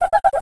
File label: Cry